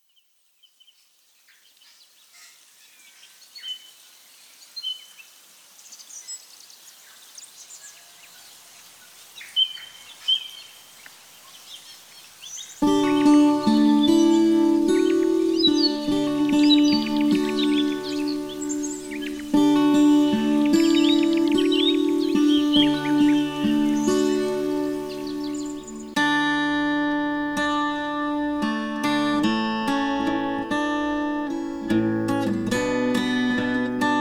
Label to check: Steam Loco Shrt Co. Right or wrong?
Wrong